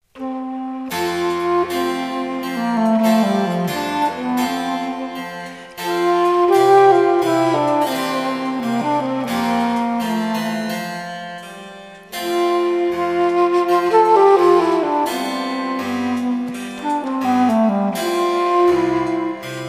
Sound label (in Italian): basso comodo, querfloete; pergolesi